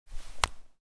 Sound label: Phone Holster; zps; Weapons; Explosives